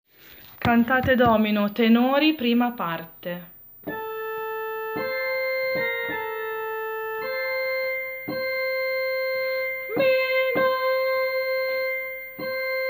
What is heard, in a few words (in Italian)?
tenori; Cantate Domino